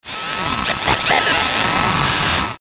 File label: static high; Static High Frequency Oscillation